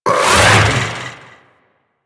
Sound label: Metronome Cast; Warframe; Amalgam Moa; Abilities